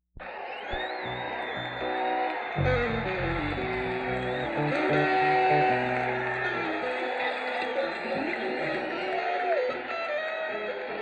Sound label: Crowd